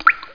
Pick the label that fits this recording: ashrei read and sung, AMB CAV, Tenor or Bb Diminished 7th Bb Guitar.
AMB CAV